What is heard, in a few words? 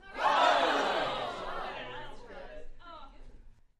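Crowd 15 Interior Rowdy; small int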